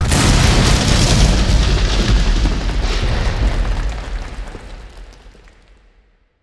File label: Cave Guardian Bust Through Ceiling; Journey